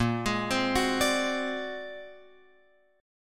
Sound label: A sharp Augmented 9th A sharp Guitar Open F; A#+9 arpeggiated; JGuitar